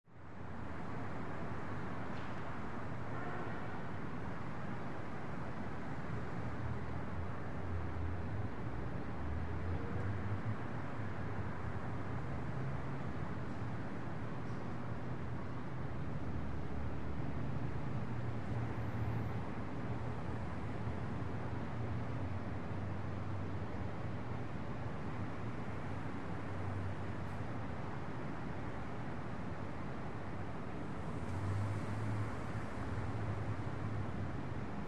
No A / C, Airy Room With Traffic Wash Through Open Window.